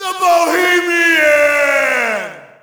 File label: Shout, audience; UFC Tapout 2